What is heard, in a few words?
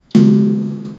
the humming sound when th, tank